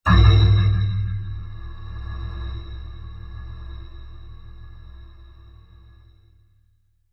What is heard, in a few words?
Sci-Fi Impact With Stutter; Science Fiction Ufo Transition Short; Science Fiction Sound Effects